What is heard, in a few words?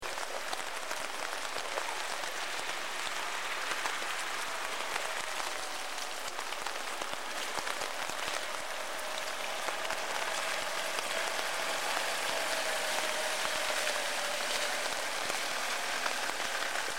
rain; roma2000